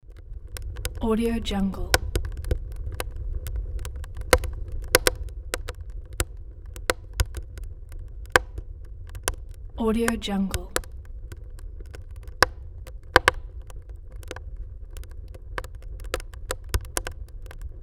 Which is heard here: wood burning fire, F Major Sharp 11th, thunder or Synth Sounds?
wood burning fire